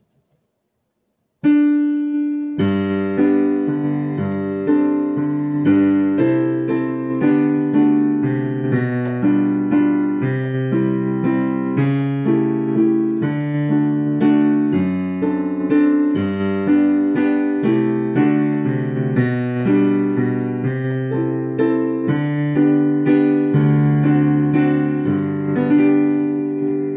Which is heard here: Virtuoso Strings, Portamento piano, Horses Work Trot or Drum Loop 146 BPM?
Portamento piano